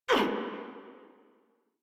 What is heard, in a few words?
Pain Grineer Female, Barks, Battle; Warframe